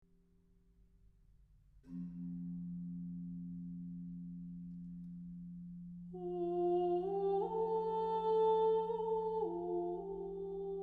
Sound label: Special Antiphon